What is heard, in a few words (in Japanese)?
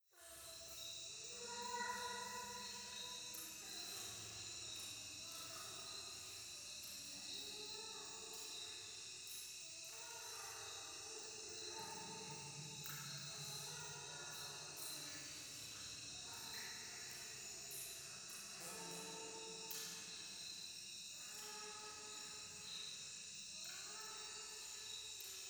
廃墟 イラマチオ 山奥夜